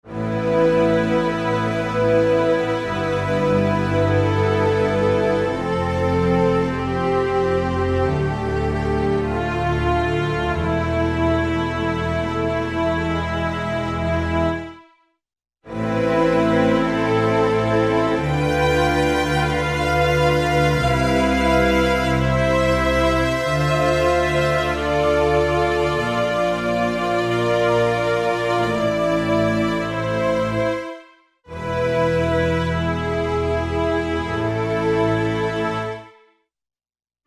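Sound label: Large Ensemble, Strings And Orchestral Percussion; Roland L-CDX-04 Strings And Orchestral Percussion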